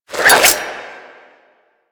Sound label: Grapple Windup